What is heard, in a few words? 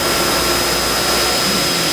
Laser Presetter